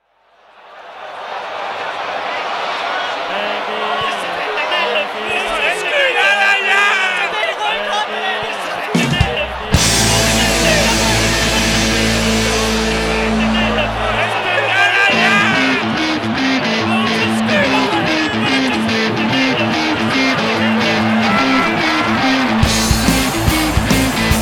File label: Rock